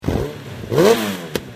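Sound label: accel akra, Yamaha XJR exhaust; akrapovic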